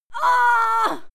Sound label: female fight moan pain heavy; schreie; menschen